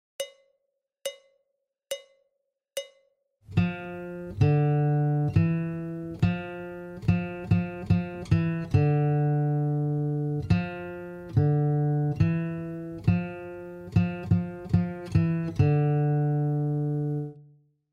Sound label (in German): WarmUp F mittel, Gitarre